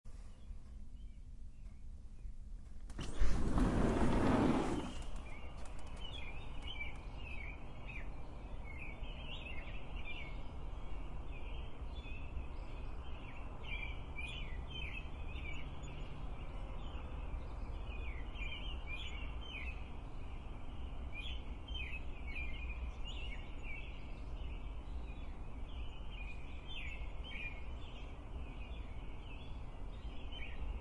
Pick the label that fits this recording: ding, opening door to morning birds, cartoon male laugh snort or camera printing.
opening door to morning birds